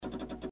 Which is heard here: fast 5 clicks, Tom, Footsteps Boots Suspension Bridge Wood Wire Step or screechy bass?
fast 5 clicks